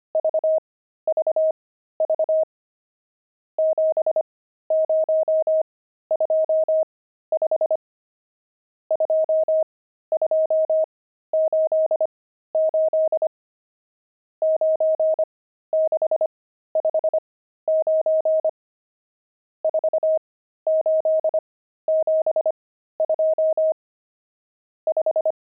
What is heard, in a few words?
pangram, intermediate pangrams files